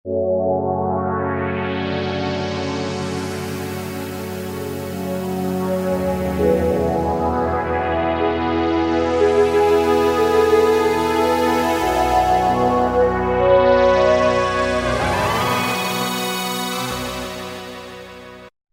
Filter Cords, Roland V-Synth; Factory Patches

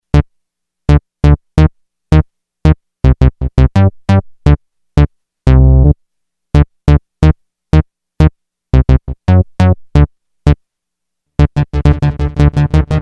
STEREO ECHO, Yamaha REX50

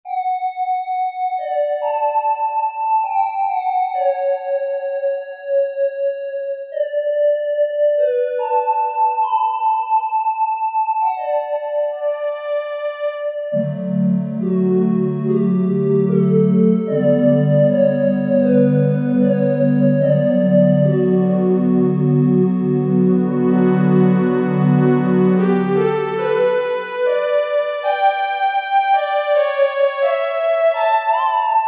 JP-8 Hollow; Factory Patches

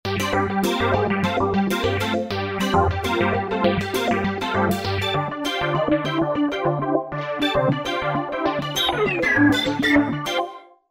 Roland V-Synth; Real Ease; Factory Patches

